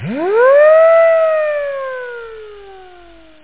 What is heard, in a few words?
WUUSSH; Groovy Bytes: Behind the Moon